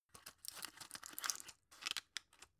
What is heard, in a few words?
drug put in soft; berufe; arzt